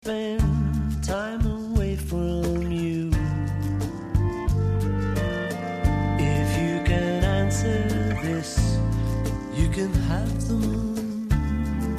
Clarinets

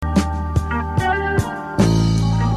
Triton Bass Isolated

